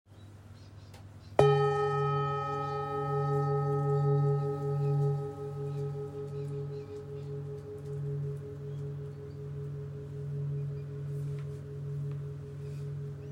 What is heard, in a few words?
bowl, singing bowl; 21cm; Polished Harmony Bowls